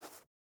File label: footstep burlap sand jump start; Rust